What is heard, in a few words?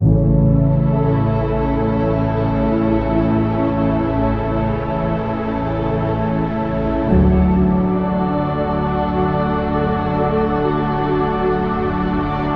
A Meditation; meditate